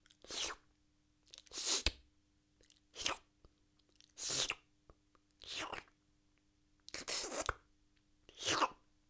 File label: Slurping